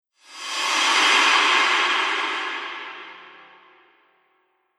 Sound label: Ghost, horror sound effect